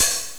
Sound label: hat; osu